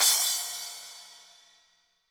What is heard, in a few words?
HiHat & Crash, Roland TD-20; HiHats